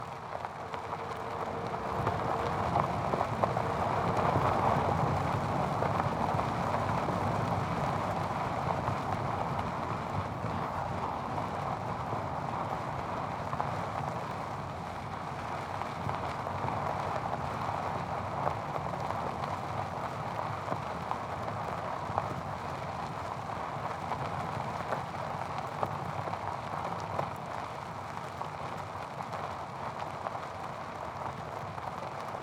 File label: Audi A4 B8 2.0 TDI Tyres Rubble High Speed To Slow; Mono; SoundHolder; Audi A4 B8 2.0 Diesel Library